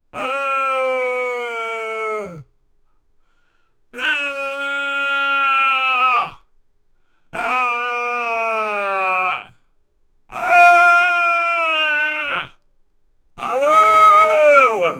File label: man sobbing mildly loud; sadness